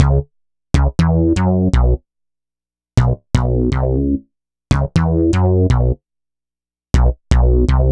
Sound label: Punch Bass; Dr. Fink's Funk Factory